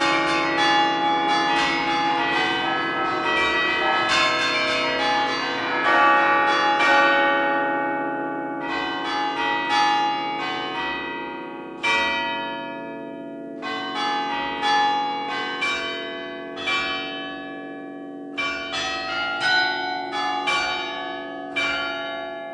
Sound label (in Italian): Campane; Madonna della Guardia; Genova; Archivio Balma Campane Liguria